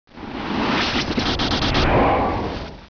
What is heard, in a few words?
weird